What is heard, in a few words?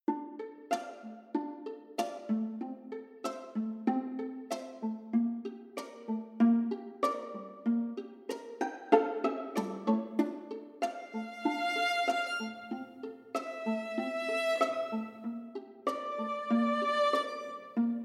Strings SOLO Orchestral string soloists; CineStrings; recorded at the MGM Scoring Stage; Cinesamples